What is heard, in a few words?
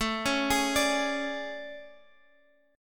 A Major 11th, Guitar, Standard; arpeggiated